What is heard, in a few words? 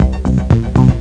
5 bar c-a#-c; Swoop 1.0.2; Swoop Music